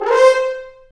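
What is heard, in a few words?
french horn, brass; Roland - LA Composer vol. 1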